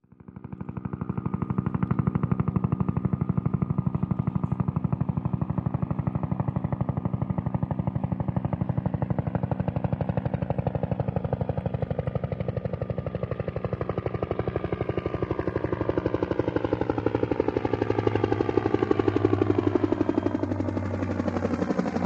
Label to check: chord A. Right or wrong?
Wrong